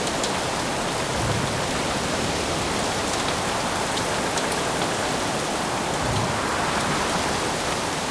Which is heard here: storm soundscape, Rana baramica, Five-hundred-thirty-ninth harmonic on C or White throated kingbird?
storm soundscape